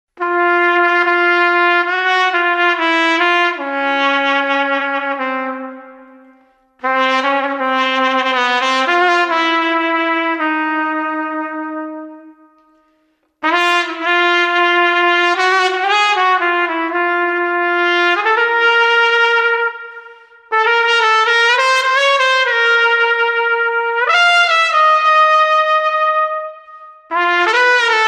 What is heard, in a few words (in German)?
trompete